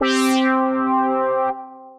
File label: Brass; Ableton; Creative Extensions; Racks; Brass Feeder